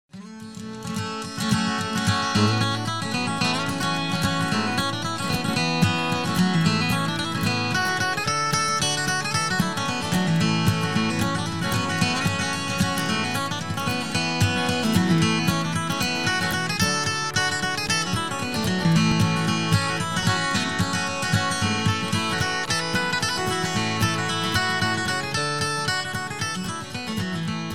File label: Fiddle tunes, Slight EQ, short